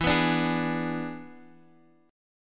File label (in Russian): Boj E7